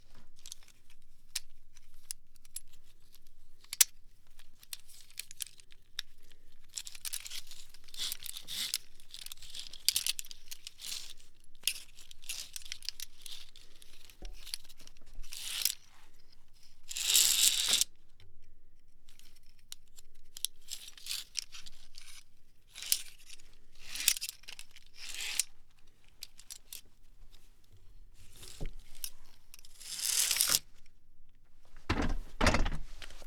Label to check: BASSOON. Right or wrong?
Wrong